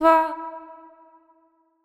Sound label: Female Vocals, Wet; Advent Calendar 2017 Day 1